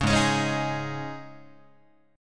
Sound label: G sharp Suspended 4th G sharp, Guitar; G#sus4 strummed; 4,4,4,6,7,7; JGuitar